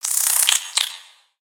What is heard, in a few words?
Spider Alert; creatures mechanical; Lego